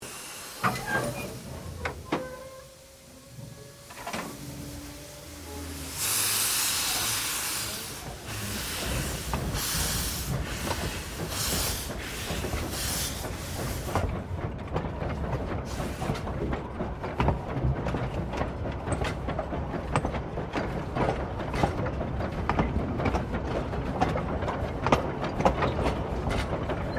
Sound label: Steamtrain Starting; Bellarine Tourist railway vehicles